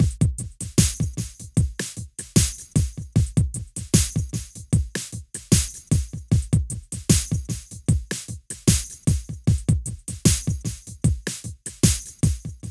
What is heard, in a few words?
Warfare Drums